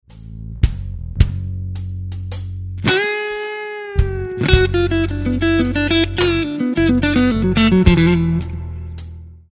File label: Lick, guitar; Slow Minor Lick 13; Minor Blues licks